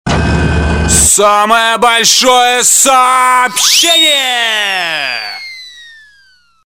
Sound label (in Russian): sms samoe bolshoe soobshenie; смс; Самое большое сообщение; АУДИОЮМОР